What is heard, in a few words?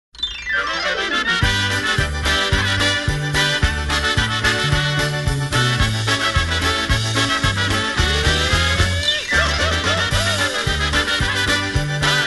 Polka